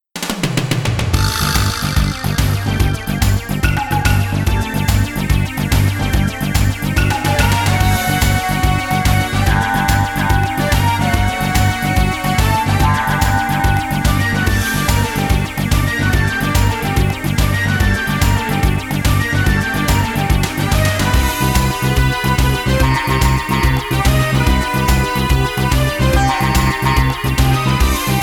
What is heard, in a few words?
Battle; Kidou Shinsengumi Moeyo Ken